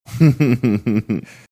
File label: chuckling